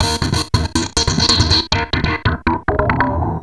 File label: Treat Guit, Industrial; Nasty